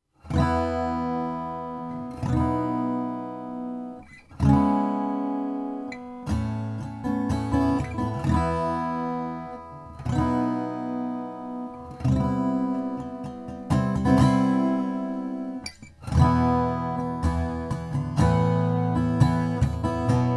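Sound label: tom sands om cutaway old growth indian rosewood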